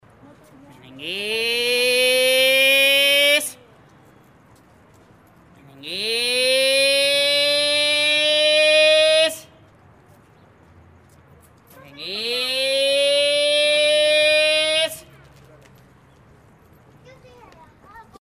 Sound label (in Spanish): merenges